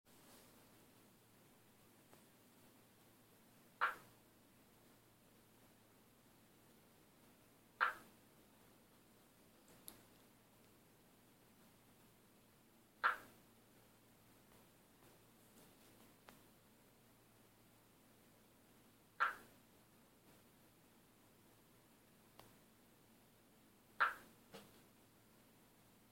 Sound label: Leptopelis uluguruensis